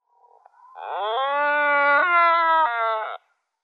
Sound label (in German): Schwarzbrauenalbatros Diomedea melanophris; Vogelstimmen